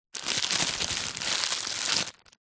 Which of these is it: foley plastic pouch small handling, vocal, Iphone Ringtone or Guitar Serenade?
foley plastic pouch small handling